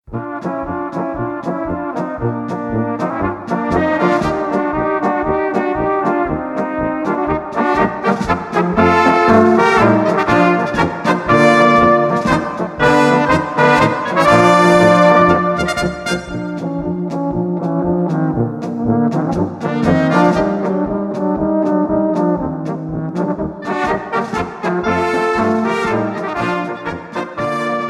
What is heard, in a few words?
Polka